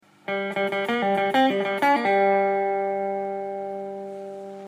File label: Open Strings In Blues Solos Lick, guitar